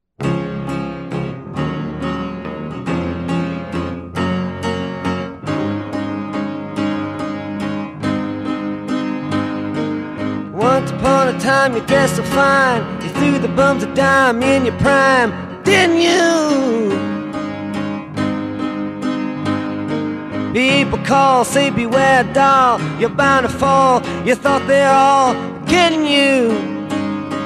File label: Piano